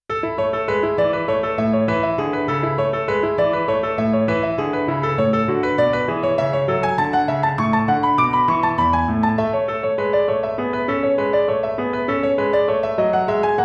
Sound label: Piano Sonata in A Major, Toccata